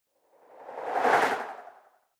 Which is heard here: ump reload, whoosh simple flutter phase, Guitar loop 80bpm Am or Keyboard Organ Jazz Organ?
whoosh simple flutter phase